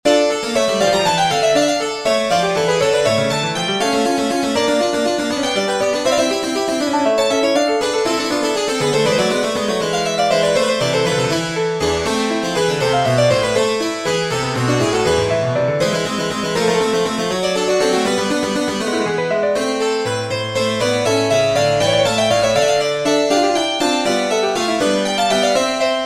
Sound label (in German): Partimento in d-Moll für 2 Instrumente; F. Durante